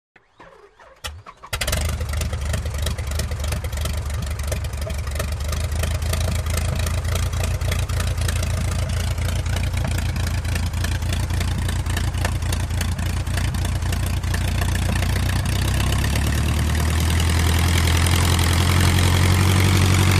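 D.H. 82 Tiger Moth. Start And Idle, Very Raspy Tiger Moth Engine With Hollow Metallic Knocking. Slow Taxi Away. Close To Medium Perspective. Prop Plane.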